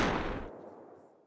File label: Inf Weapons; Red Orchestra 41-45